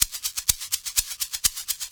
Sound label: mix percussion loops, 125; PS-28 Vocal House Samples